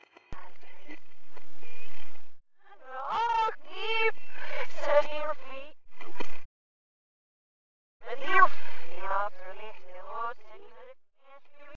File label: faint reversed high; century ghost; winchester